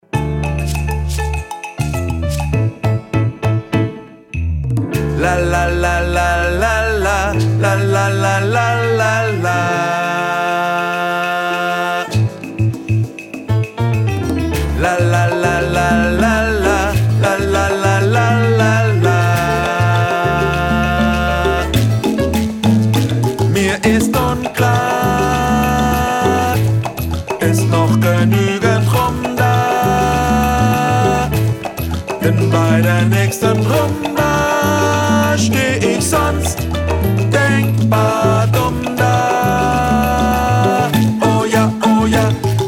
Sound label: Tenor